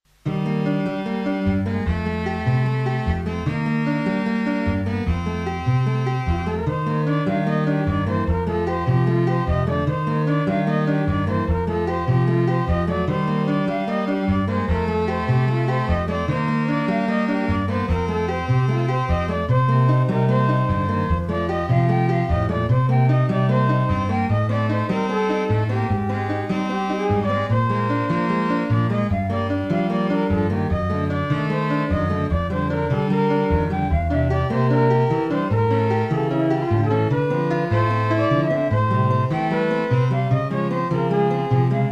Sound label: Trio